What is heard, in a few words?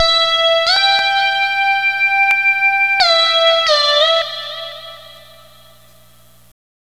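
guitar; Demo Multitracks; 90bpm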